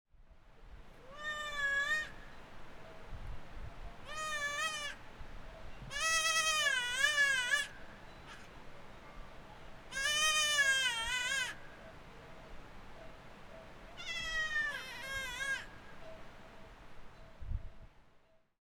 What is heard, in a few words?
Baby goats of the Tiger Leaping Gorge, China; Les Cartes Postales Sonores; NOISE ON EARTH Vol6